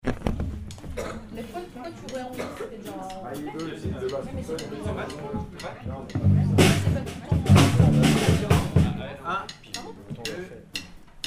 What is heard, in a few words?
basse complete, brass band; Courcelles Sur Aujon